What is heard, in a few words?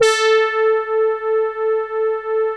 BIT BRASS, Vintage Keyboards; Big Fish Audio - First Call Vintage Keyboards